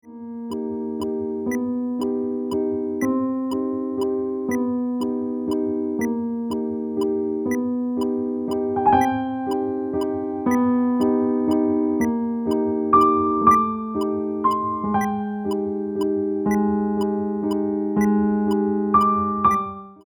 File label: PIANO